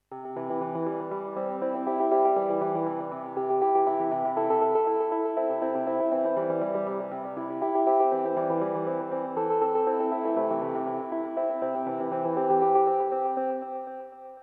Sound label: ringtone